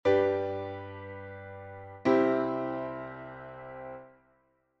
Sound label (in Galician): Sol M - Do M